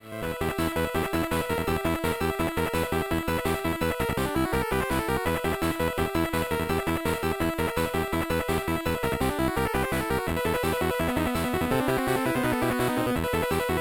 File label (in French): musiques boss niveau 2